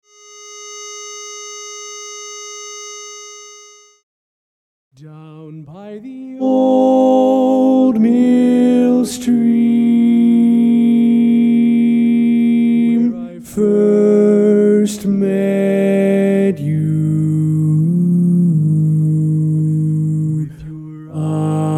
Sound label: Baritone